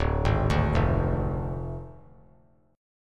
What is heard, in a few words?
D Diminished D; arpeggiated